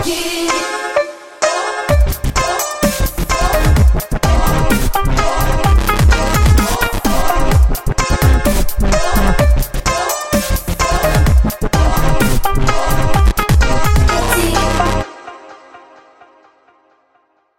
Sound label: dubstep